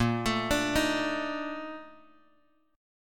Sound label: A sharp Major 11th, chord; A#M11 Chord {6 X 7 7 4 X} arpeggiated